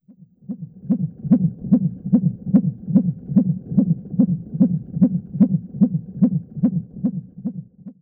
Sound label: fetal heart